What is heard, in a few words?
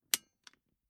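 Car Classic Bentley Eight Seatbelt Clip Metallic Clipped In; Two Parts; Tom Hutchings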